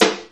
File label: old school perfect snare drum sample A#; Duration 0:01; Mono